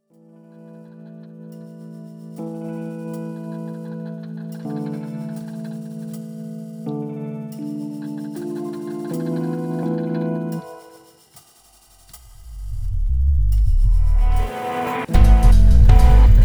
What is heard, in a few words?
Lounge